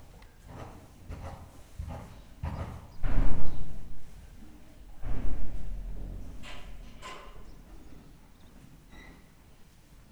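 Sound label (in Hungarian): háttérzajok nyerítések söprögetés, ló; Hortobágyi Nemzeti Park 2013; máta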